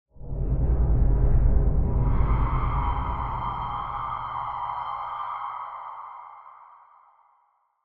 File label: Pad, Sylenth1; Pad - Materialism; Routed Sound; Sylenth1 Expansion - Doom Carpenter Vol.1